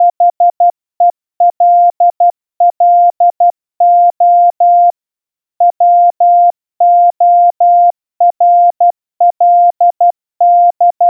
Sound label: morse hello world